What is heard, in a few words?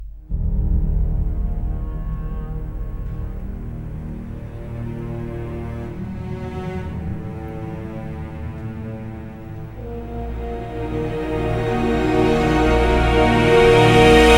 Underwater March; Piráti z Karibiku; The Curse Of The Black Pearl